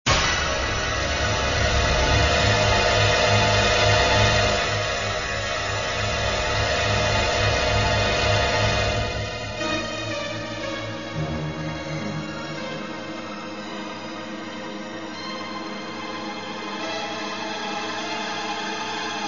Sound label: Scary Music